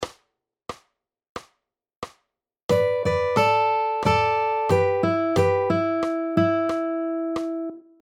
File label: Pentatonic Riffs